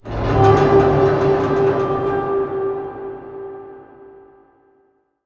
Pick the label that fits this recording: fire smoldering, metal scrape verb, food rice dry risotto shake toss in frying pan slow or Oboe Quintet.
metal scrape verb